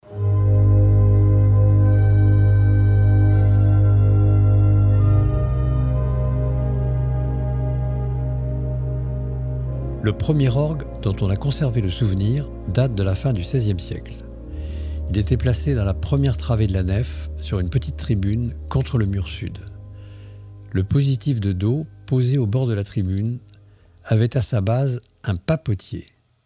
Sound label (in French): Grand Orgue; Basilique Avesnieres; Basilique Avesnieres Le Grand Orgue